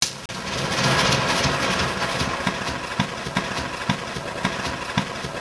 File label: coughing; Bombable; flightgear